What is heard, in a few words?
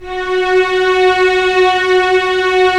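STR String Section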